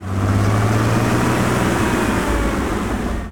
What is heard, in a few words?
engines; World of Tanks